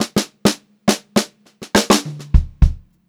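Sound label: 104 straight fill, Funk Groove Drumloops; USB Soundscan vol.38; AKAI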